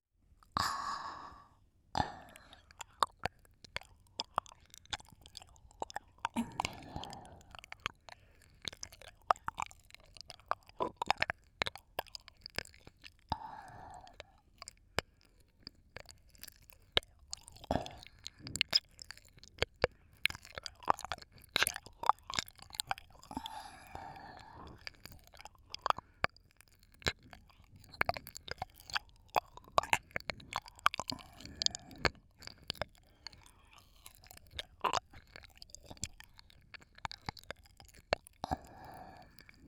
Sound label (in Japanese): 耳舐め, ASMR; SEなし